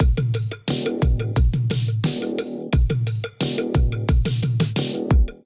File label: Diatribal Kit Melodic 88 bpm, Drums, Complex; MIDI Clips; Drive and Glow; Ableton; Factory Packs